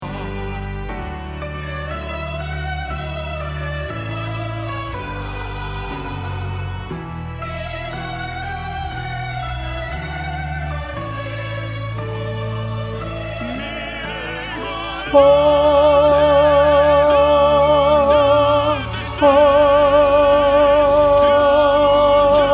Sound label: nessun dorma tenor; tenor 1